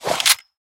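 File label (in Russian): присоединение бластера для создания более мощного оружия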